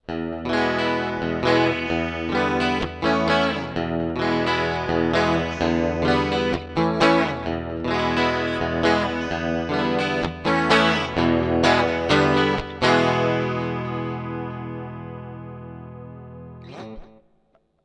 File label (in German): E-Gitarre Mitte lauter Seiten leiser; SPL Gemini